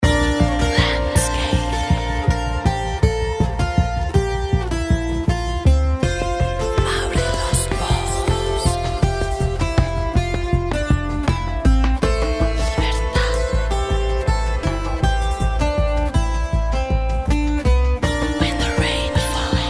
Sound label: ambient